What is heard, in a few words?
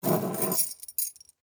Skeleton Key Ring, Pick, Up; Foley; Jangling (Sound Effects:Objects); BlastWave